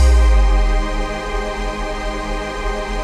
ATMO PAD; 90 s Sample CDs; Best Service; Dance Mega Jungle Rave

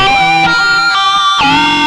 xtra lead (bender); Sonic Foundry (Sony Creative Software); Ugly Remnants VOL-2

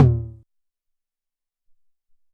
Dans Tom, Alesis SR-18

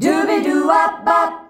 DUBIDUWA E, FEMALES, Hyper Dance

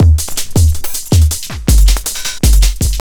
JUNGL 160, JUNGLE LOOP

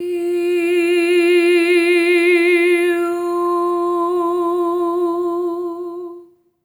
EH OH F4, SOP; Spectrasonics; Symphony of Voices

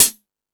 Hip House, drum machine

